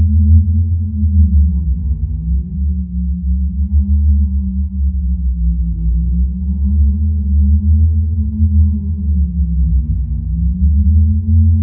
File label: MAGIC DEEP